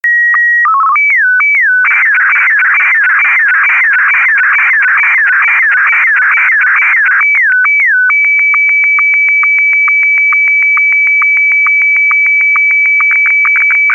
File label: sstv